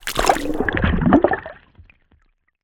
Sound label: creature crab texture underwater; sonniss gdc